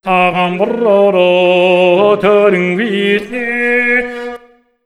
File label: MONGOL, Asian Traditions